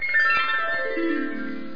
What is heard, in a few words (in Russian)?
arfa perelivi